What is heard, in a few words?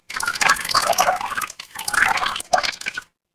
water swirl